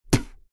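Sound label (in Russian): zvuki plevka cheloveka, human spitting sounds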